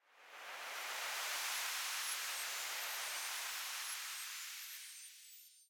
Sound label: dazzle Anticipation Start; fortunerangers